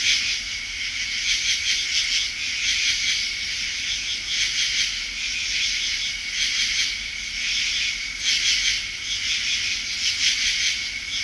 lake night; crc2005

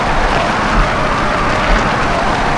Rocky Skid Ext; RallyXtreme; Surfaces

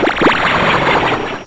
bubble

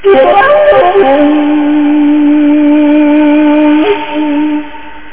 Panflute

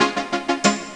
Witch; AMUG Show

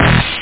bass+hhat

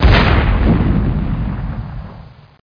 EXPL

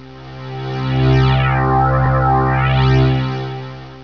Hum Warp; WARLOCKSNDS

